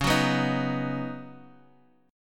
C sharp 7th Suspended 2nd Suspended 4th, C sharp, down, Guitar, Drop D